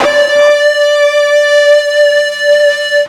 FUZZ D 4, ULTRA FUZZ, Guitar; East West; Steve Stevens Guitar Samples Collection

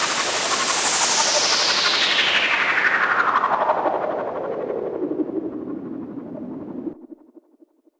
Sweep; noise

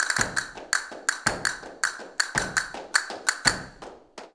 CASTANETS, Flamenco

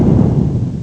little explod; ST-XX Amiga Soundtracker Sample Packs

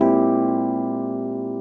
CHORD, Drum & Bass, 150 BPM

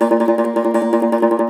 Cumbus SAZ A#, Plucked Strings; E-MU Producer Series Vol. 7 – Old World Instruments

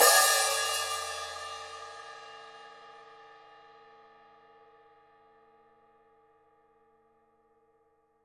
Open Hat up; Digital Sound Factory

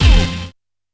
C#5 Uh! Hit, Yamaha PSR-E323 Sound Effect Kit

